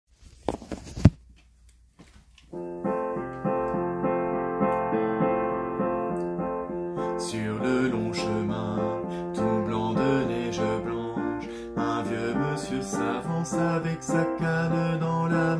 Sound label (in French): Vive le vent alto basse; telethon 2024